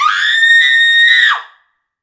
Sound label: Woman Scream